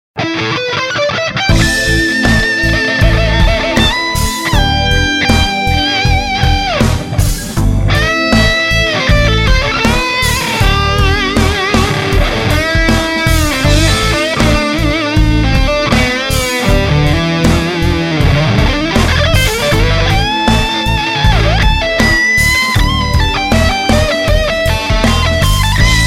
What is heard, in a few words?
Lead